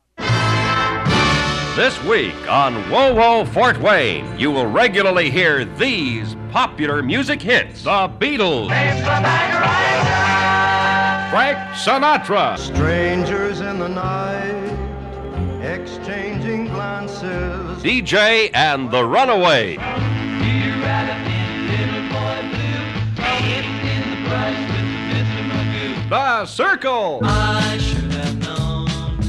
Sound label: promo music; WOWO; June 1966